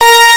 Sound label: paaaaaaaaaaaaaaaaaaaaaaaaaaaaaaaaahhhh